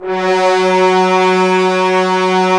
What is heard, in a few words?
BRS BNSF2GL, Roland LA Composer, Tbns; 90 s Sample CDs